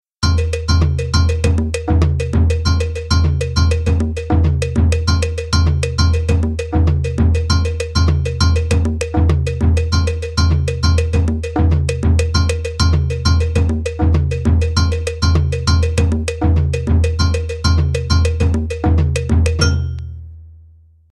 SAMBA DE ANGOLA

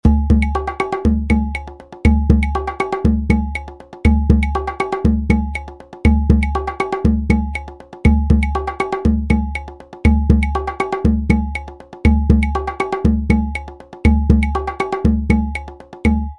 NYABINGI

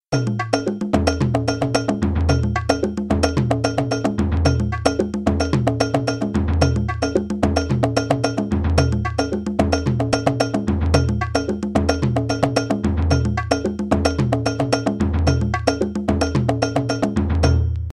SONGO

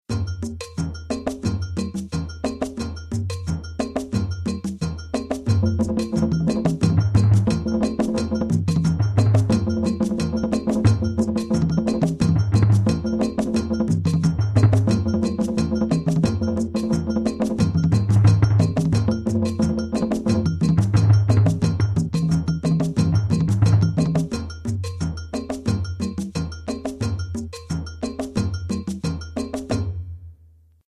KPANLOGO

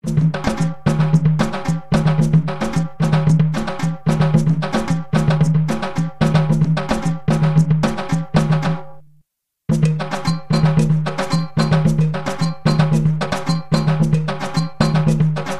GAHU

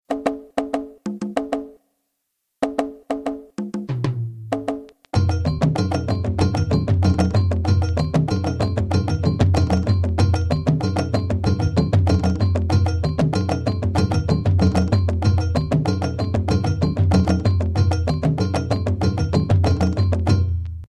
FANGA

